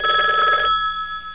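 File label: phone ring; Broderbund Demo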